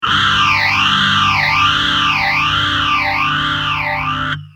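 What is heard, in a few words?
guitar Systech phaser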